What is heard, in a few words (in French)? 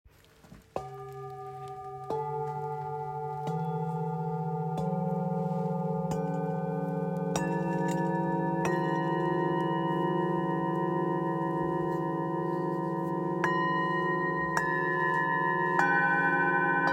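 Set, bol chantant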